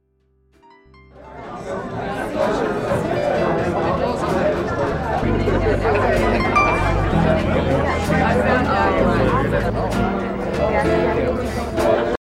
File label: Jazzy